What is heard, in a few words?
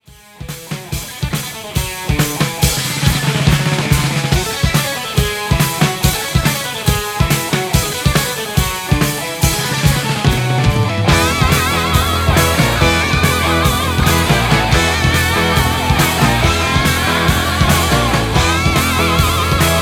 rock inst laser